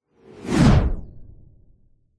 science fiction vehicles zoom by ultra sonic whoosh